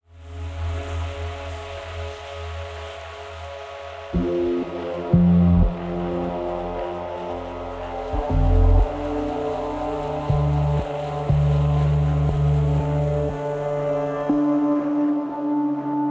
Sky sounds

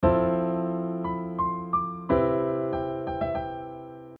chord sub C

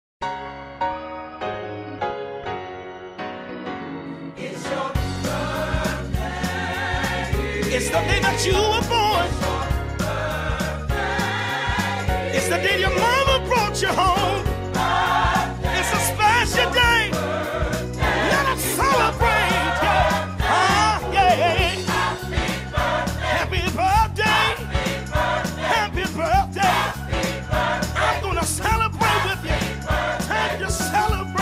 happy birthday gospel style

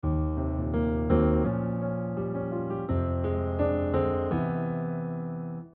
cadence negative

